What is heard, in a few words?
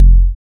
kick sub click, drill; drill toolkit